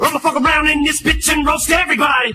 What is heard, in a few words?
roast everybody acapella